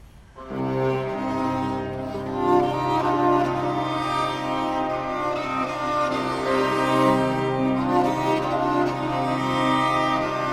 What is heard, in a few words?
prelude; Biber